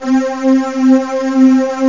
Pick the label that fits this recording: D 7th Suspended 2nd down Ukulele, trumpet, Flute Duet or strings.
strings